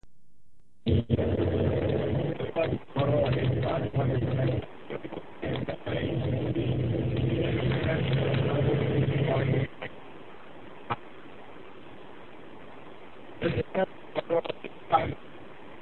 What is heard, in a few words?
Indust 95db; Moto H500; Mogul